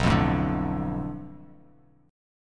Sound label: B Minor 7th Flat 5th B, chord; Bm7b5; strummed; 7,8,7,7; JGuitar